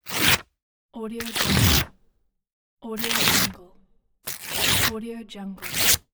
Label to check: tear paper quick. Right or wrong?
Right